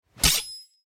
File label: Knife Pull; soundscrate